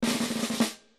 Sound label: snare